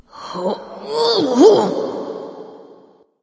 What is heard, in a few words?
grunt lift light; smite; voicepack; hel